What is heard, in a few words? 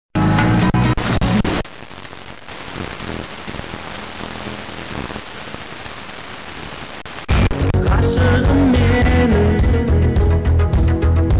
85 110 MHz